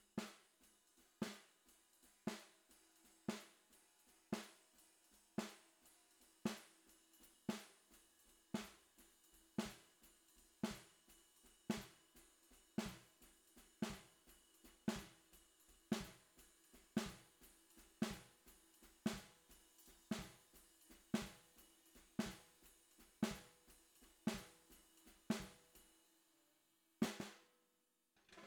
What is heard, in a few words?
SNR Bot